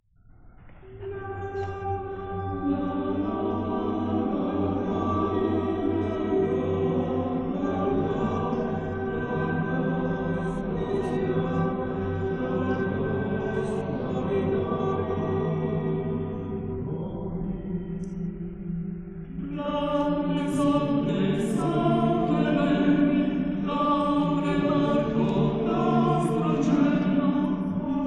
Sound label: male Choir, Cantilena